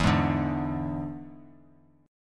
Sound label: E 7th E down; E7 strummed; JGuitar